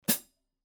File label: Power Hi Hat 14 Chick; Signature; New Instruments 2021